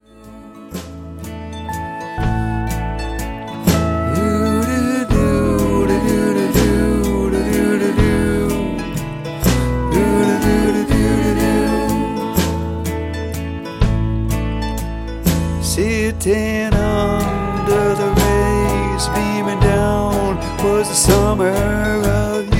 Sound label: Ooh Do Do